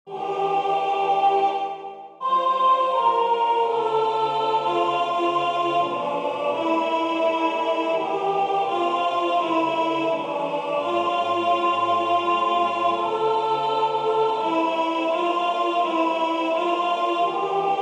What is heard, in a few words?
Transformation sop